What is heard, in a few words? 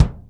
TIGHT KICK, ACOUST KICKS; Greytsounds